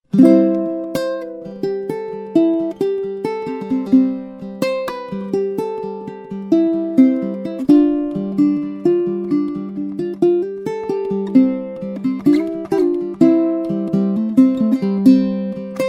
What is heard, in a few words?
uke